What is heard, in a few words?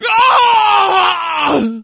fx random; justmansion